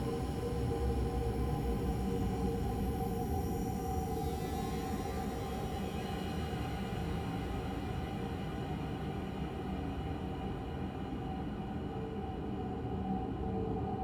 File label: Wind; LOOPERS